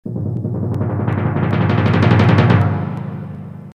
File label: tymp